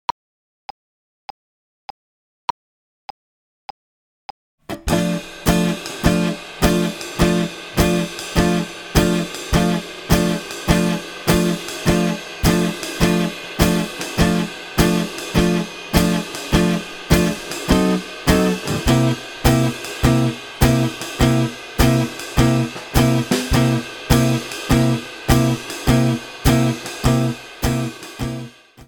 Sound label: swing slow